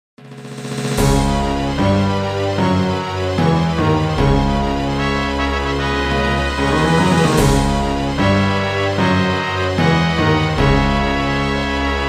Negative; The Witch and the Warrior; RPG Maker

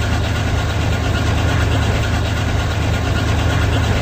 Tank

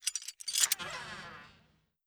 DoorLock Complete; Lumen

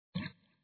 ghost Shoot; Bubble Tanks Tower Defense

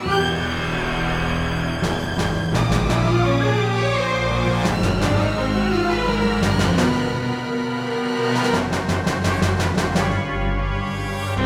Spider Fight Music

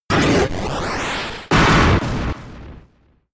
Giga Impact; Pokemon; Gen 5; Blk Wht Blk2 Wht2

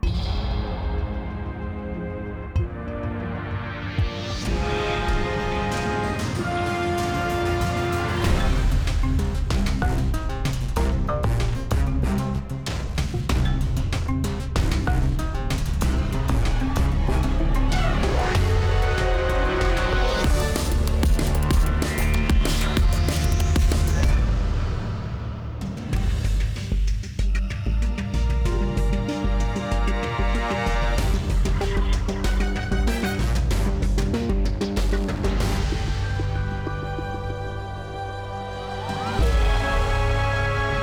Battle Loop; bakugan champions of vestroia 2020; switch gamerip

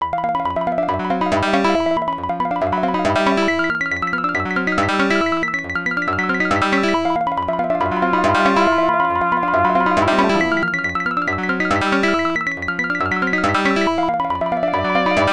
Time Out Warning; Ghouls n Ghosts; Arcade

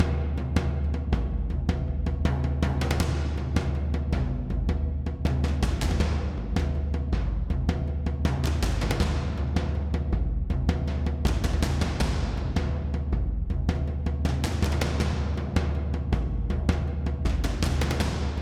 Boss Battle Stem; Arcta; Beast Quest; PS4

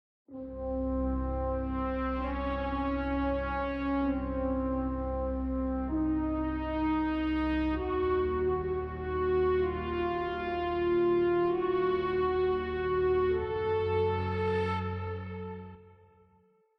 Sad Brass; Destroy All Humans

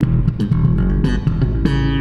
Bass wet

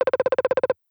twitter typing

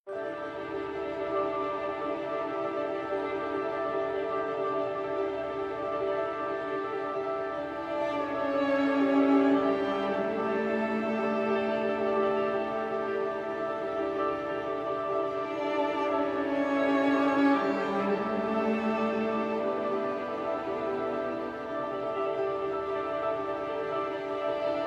Opening Music

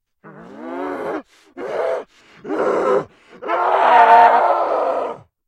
Scream

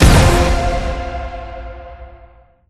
Fuse Levelup; Spider-Man Unlimited gamerip 2014 android ios windows

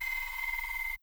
end of month graph move

